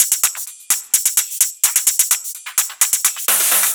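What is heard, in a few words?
Drum Loop 128BPM hi-hat; VEC5 Drumloops; VEC5 Multi Loops; Loop Elements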